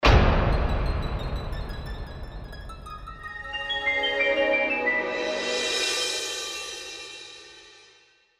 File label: Eerie Musical